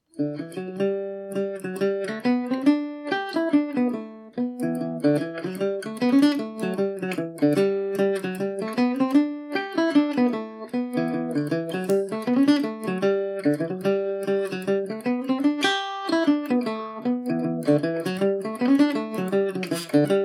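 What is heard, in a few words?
G major at 40, irish mandolin; The Teetotallers’ Reel; recorded for the Mandolin Cafe’s Song-A-Week Social Group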